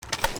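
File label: foley assault rifle akilo47 grenade launcher drop; weapons; viper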